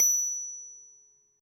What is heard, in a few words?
hit